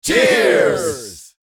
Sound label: People toasting celebration cheers